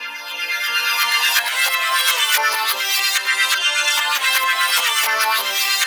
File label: INDIA ROCK, INDIAN ROCK; Spectrasonic Distorted Reality 1